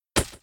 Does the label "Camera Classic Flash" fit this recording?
Yes